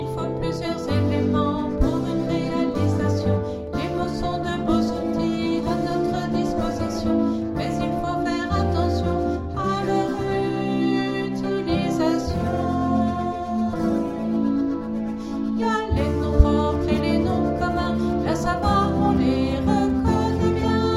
chant

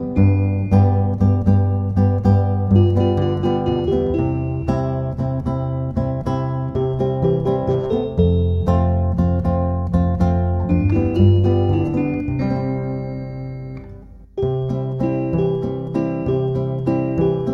Métiers d'antan instrum

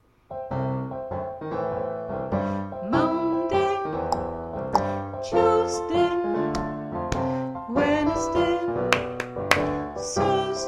chant

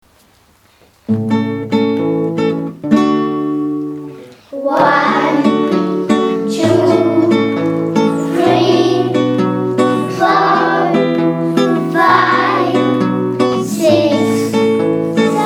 Chantons de 1 à 20 en anglais

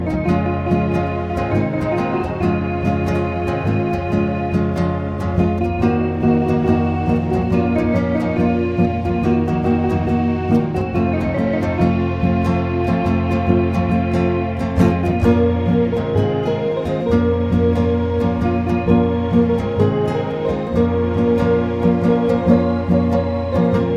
instrum

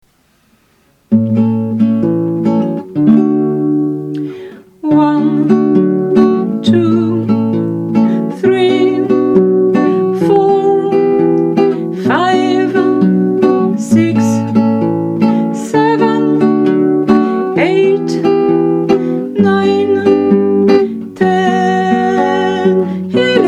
Chantons de 1 à 20 en anglais

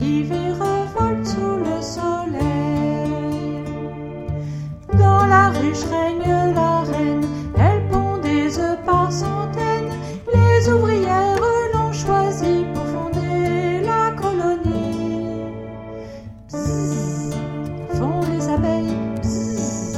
chant extrait

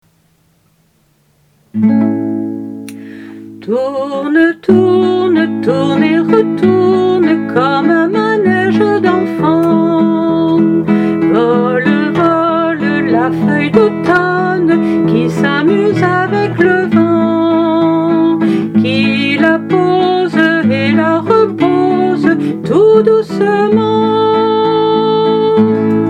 Valse des feuilles

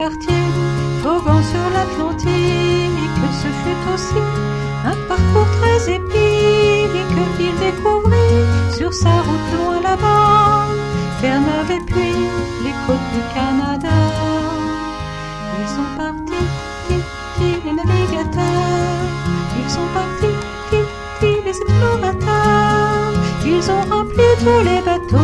chant

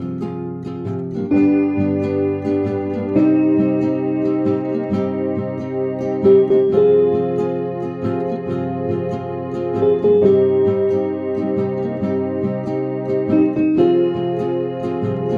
instrument

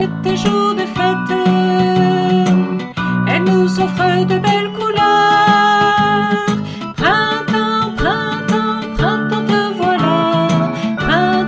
extrait chant

